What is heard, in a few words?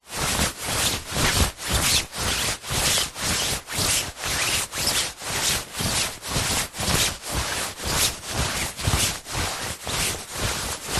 clothing thick jacket walking movements